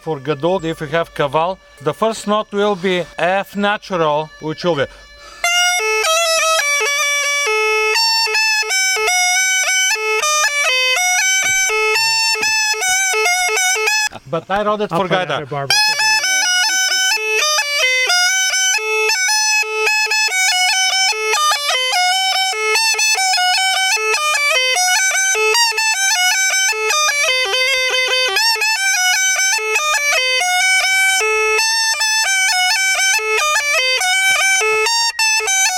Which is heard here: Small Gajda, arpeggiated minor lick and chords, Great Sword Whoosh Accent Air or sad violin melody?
Small Gajda